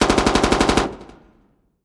ger jet fire; Hearts of Iron IV Death or Dishonor

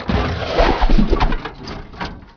inkvine shot; Emperor - Battle for Dune

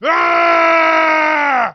man yell; Mount&Blade Warband

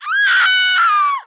female death; Emperor - Battle for Dune

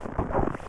after snow; Sid Meier's Civilization 4 Complete; Beyond the Sword; Units

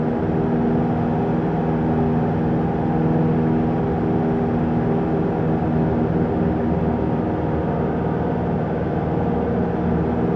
distance airplane idle; Hearts of Iron IV Death or Dishonor

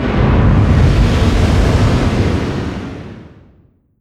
distance ship miss big effect; Hearts of Iron IV Death or Dishonor

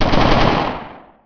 light infantry; Emperor - Battle for Dune

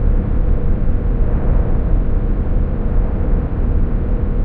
cargo hold; Garry's Mod; hl2

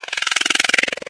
warning, headcrab poison; Garry's Mod; hl2

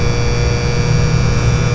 vending machine hum loop; ambient, spacial loops; Left 4 Dead 2

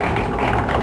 Wood Gears; Sid Meier's Civilization 4 Complete; Warlords; Units

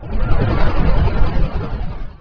deviator move start; Emperor - Battle for Dune

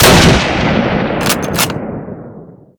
kar98k Sniper Shoot; Minecraft; Infamy; Last Alive Pack

